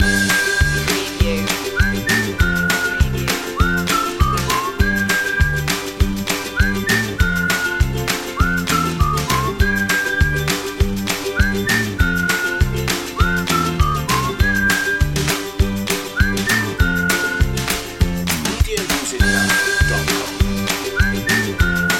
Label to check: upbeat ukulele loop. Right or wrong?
Right